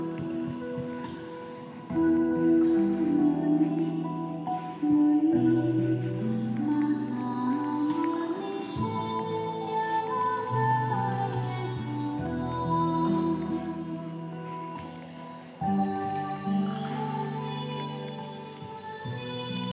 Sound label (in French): Chanson Malaisienne